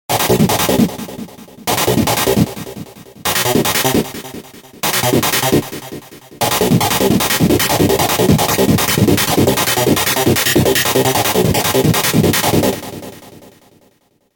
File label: Metallic Arp